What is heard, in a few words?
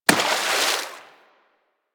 Melee Impact Water; Warframe; Weapons